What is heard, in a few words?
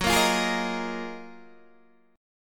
F sharp Major 13th, Guitar, Open D; 16,13,15,14,13,13